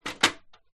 industrial step ladder metal pick up

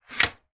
technology laptop dvd tray close

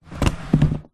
foley case heavy slide push soft floor

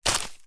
footstep single shoe wood chippings pile

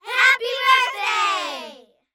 Kids Happy Birthday; Blastwave FX

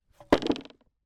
impact wood panel drop on ground

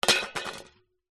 metal money tin lid set down on table vibrate; zapsplat

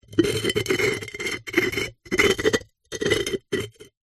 household pot ceramic base lid scrape

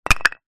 foley brick down on hard ground; zapsplat

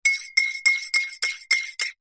stir coffee mug spoon; food drink; zapsplat

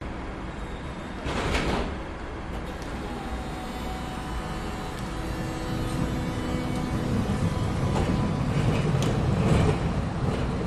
Tram Leaves; Budapest Hungary

